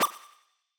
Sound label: Menu Button Select; BopIt MenuSFX ButtonSelect